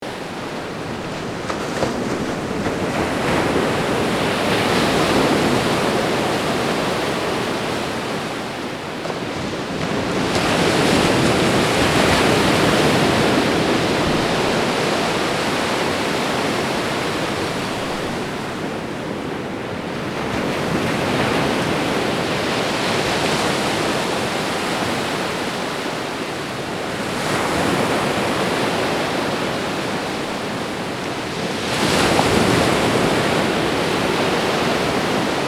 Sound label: gentle ocean